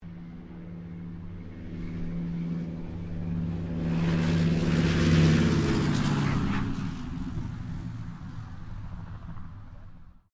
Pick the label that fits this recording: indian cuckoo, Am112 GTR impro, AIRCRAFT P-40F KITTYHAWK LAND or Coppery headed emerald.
AIRCRAFT P-40F KITTYHAWK LAND